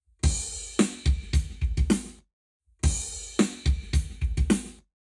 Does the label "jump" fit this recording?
No